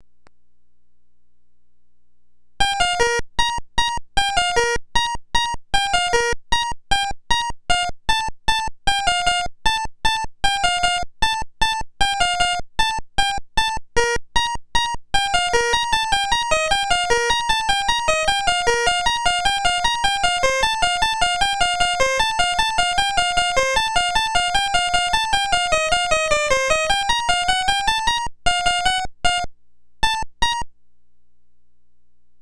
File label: PIANO